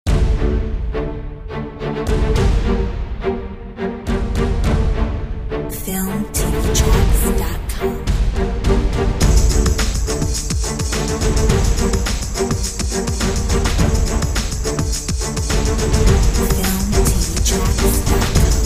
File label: Warning drums